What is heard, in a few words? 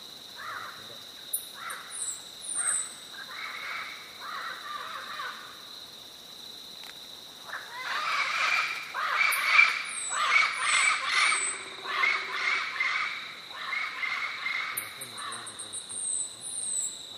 yellow crowned amazon; پرنده آمازون تاجزرد